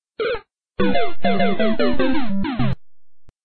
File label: fail sound; osu; spelunker